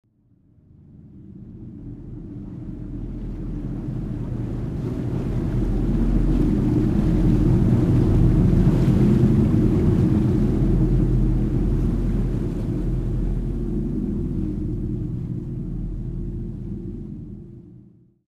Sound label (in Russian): proplyvajuschaja podvodnaja lodka